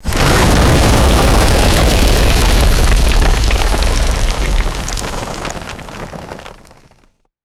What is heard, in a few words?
heavy crumbling debris crash and slide; natural-disaster